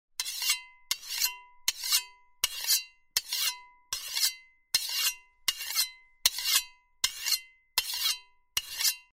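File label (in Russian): tochat noj, sharpening a knife; Звуки на которых точат ножи; Звуки на которых точат ножи, много разных хороших вариантов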